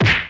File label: vintage cartoon wall, Cartoon Sound Effects; UNUSUAL KITS and FX